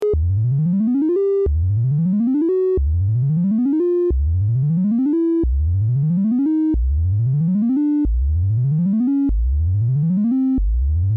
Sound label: vco track with seq; analog synth